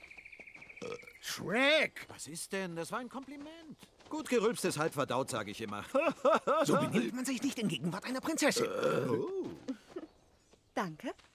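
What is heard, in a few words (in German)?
Rülps; Shrek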